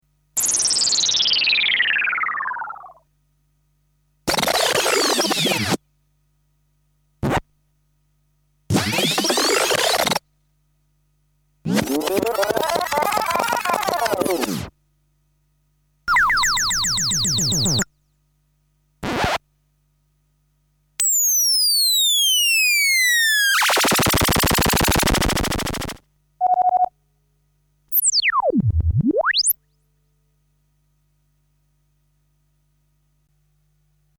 Sound Effects; Kniteforce; 1000 Samples Volume 1

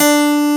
KEY MICRO, Pop Pianos; Roland L-CD701

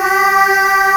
B 2, POP CHORUS

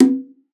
BONGO; BONGOS; Xsample VOL-9

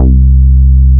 JUNO E-BS C3; Drums In Your FACE!

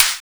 WHITE SNARE; Drums In Your FACE!

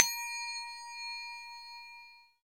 percussion bells

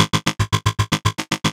DANCE FX; Best Service; XXL Production Effects; Sample CDs

